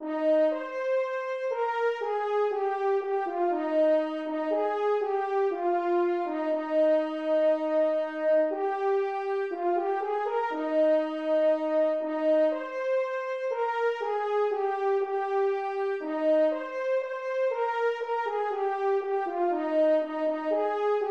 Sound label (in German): sopran